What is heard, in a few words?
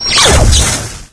combine shield activate; video games; ambient; source engine; portal2; outland